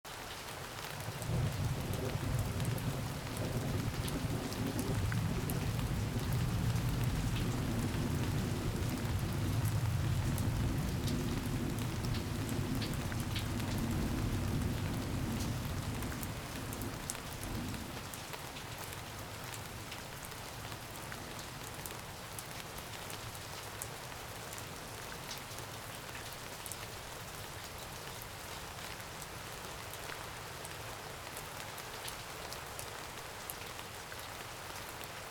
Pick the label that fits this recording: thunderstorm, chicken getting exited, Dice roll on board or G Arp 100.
thunderstorm